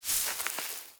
zone bush step

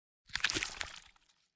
environment heart damage gore

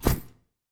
mob formation stack

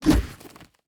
order move

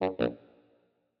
ui error

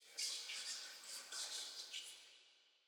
ambience room shadow room working layer

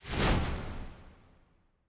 ambience room shadow

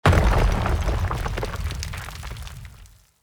Wall Break; TANK; 3Souls

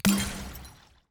projectile; necrochemist; Shelter

